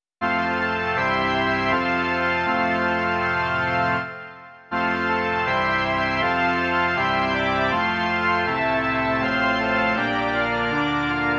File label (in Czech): Slyšíš zvony - varhany; Varhanní verze